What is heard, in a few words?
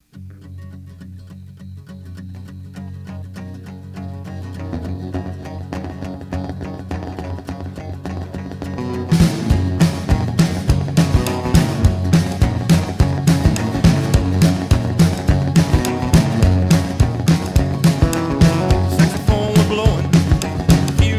Rock & Roll Band